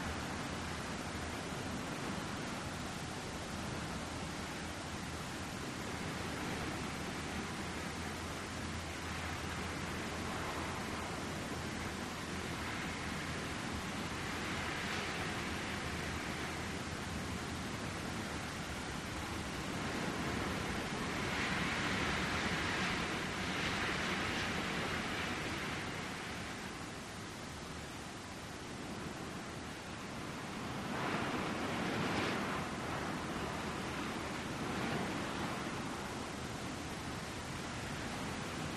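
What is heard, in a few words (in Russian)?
рассеянный легкий ветер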